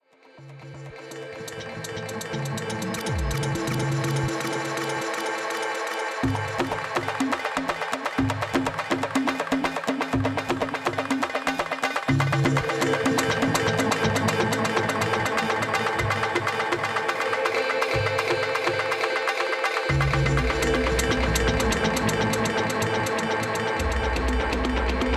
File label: Ambient Dub